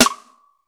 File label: Snare, drums one shots; Combo Segamega